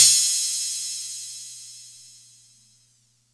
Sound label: Atlantex Music Percussion Computer Cymbal Long